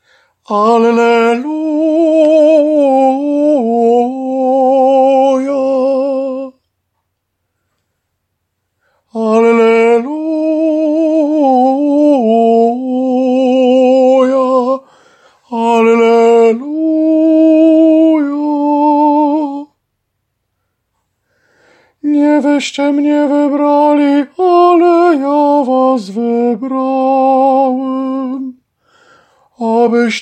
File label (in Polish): Alleluja SOPRAN